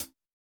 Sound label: hihat, house